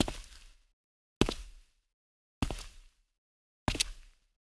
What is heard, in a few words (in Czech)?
kroky; Mafie 1